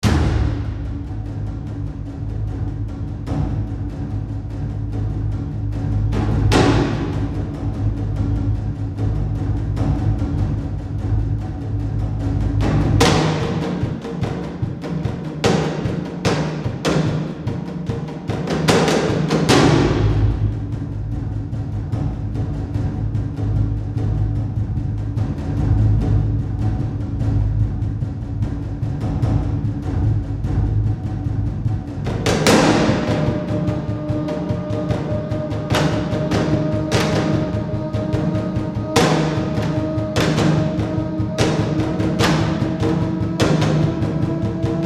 Tension Perc Loop; The Last of Us

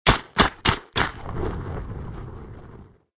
Dwarves Quarry Worker Buried In

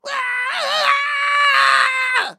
scream, Sky Diving

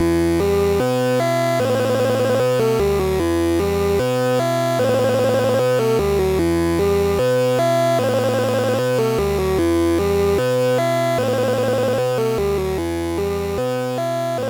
Mysterioso Pizzicato; The Three Stooges; NES; I. Fleecem; The Villain's Theme

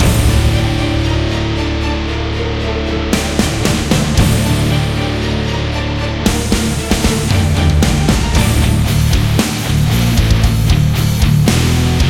bgm loop; naruto ultimate storm android ios gamerip 2016; naruto battlefile bgm yanyincun

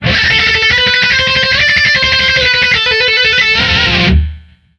Guitar shred; Back to the Future: The Game; Ep. 1 It's About Time

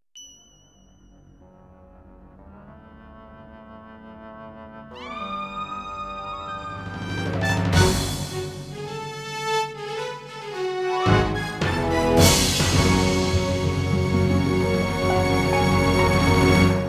Insert Coin; Light Bringer

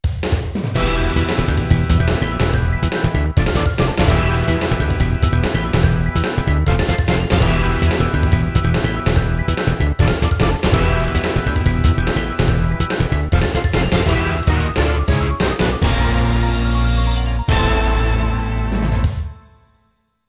Fight Continued (Game Over); Variable Geo 2; PC-9801

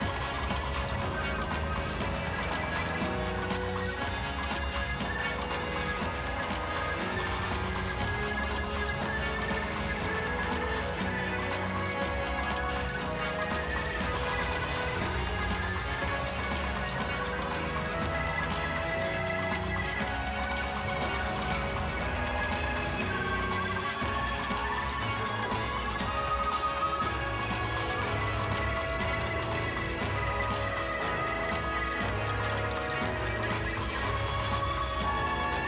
Swamp; Avatar The Last Airbender

